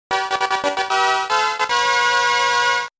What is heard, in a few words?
Bonus Round Fanfare; Mappy